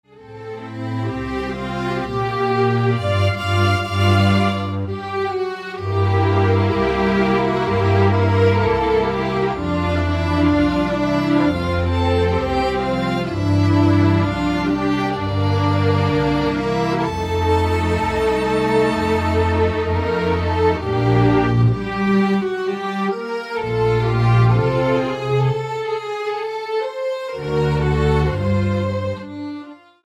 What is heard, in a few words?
Polka Dolorosa; the king and i